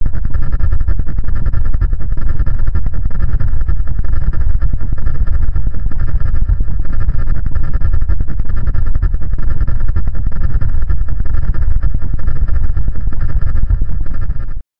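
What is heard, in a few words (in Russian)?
пульсация импульсы биение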